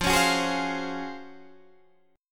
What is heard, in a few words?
Db Major 11th F